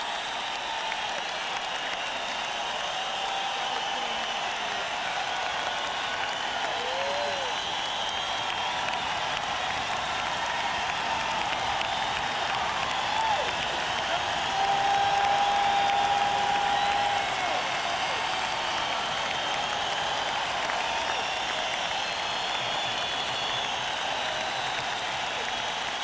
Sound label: Encore Break; beyer m88